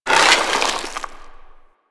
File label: Inf Arm Cannon Charge Done; Warframe; Weapons; Infested